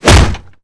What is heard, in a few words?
foot kick door; Garrys Mod